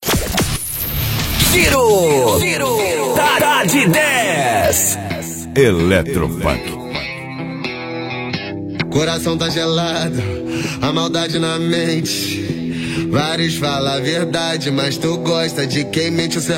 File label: Electro Funk